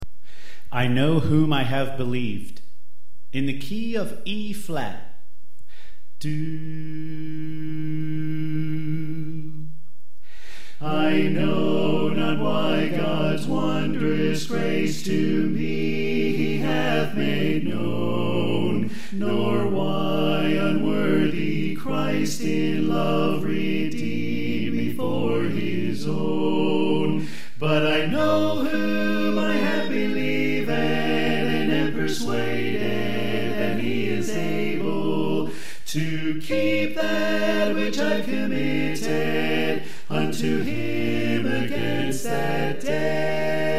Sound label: Bass Left; I Know Whom I Have Believed